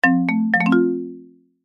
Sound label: multimedia alert notification musical short marimba completed finished success; zapsplat